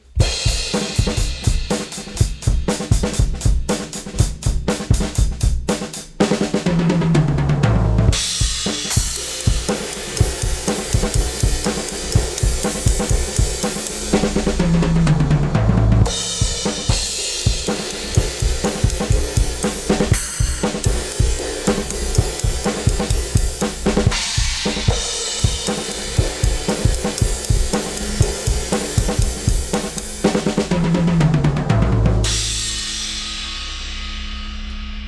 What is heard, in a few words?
Compressor RELEASE SLOW; ADC1 Compressor